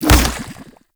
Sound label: Regurgitate Proj Impact; Warframe; Grendel